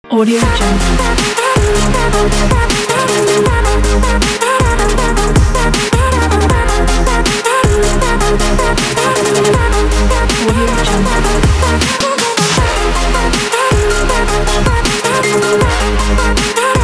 this future bass

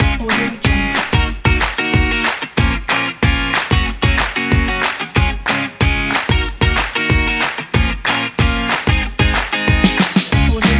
upbeat acoustic collection pack; audiobasket

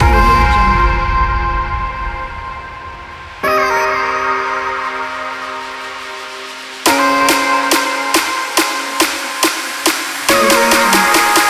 a dubstep

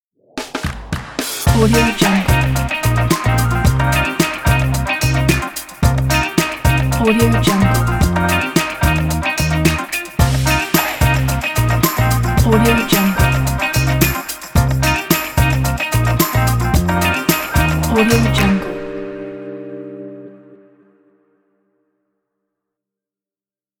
bright upbeat podcast intro

